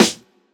snare sound a key, Royality free snare single hit tuned to the A note. Loudest frequency: 2236Hz; snare-drum-samples